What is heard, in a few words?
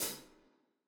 hall resonance hihat